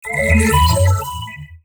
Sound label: melodic fx cvetok; base pack